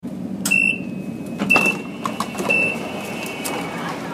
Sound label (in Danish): s-tog door frigives; S-tog døre #2; 4. generations S-tog (Litra SA) ydredøre frigives.; Michael Hansen, Sommer 2012, 4. generations S-tog